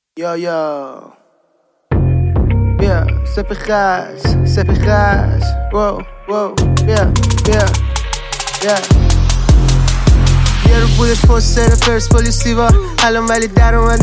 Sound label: Trap House